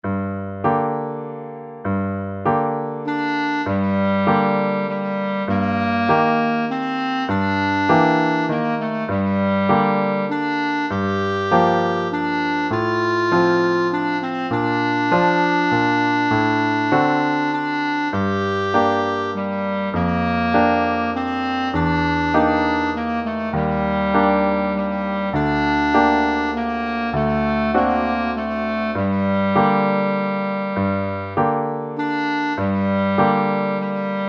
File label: Piano Clarinet